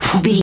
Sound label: voice male breath quick